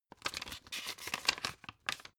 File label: old flip page